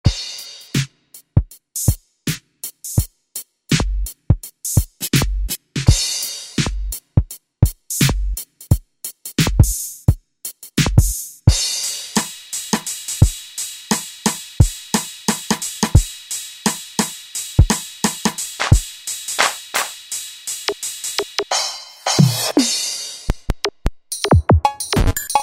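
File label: drums Street Kit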